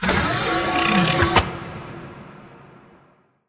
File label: Giant Pillar Pods Move; Titanfall 2; Boomtown